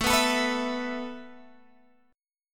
A Suspended 2nd A Guitar Open G

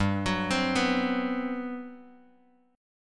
G Major 11th, Bass Standard; arpeggiated; JGuitar

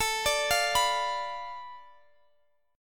F 6th Flat 5th A, chord

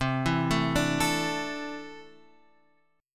E 7th Suspended 4th Sharp 5th C

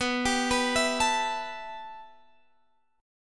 B 7th Suspended 4th Sharp 5th

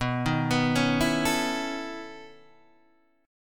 B 11th B Guitar DADGAD, chord

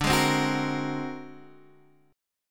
Ab 9th Flat 5th D

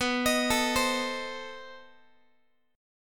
B 7th Flat 9th, down, Guitar; B7b9 arpeggiated; x,19,18,19,16,x; JGuitar

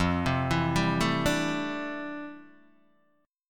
F Major 13th Guitar Open D

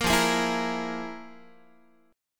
F Minor Major 7th Double Flat 5th, Guitar; FmM7bb5 strummed; JGuitar

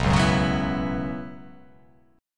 B Suspended 2nd Suspended 4th down; strummed; JGuitar

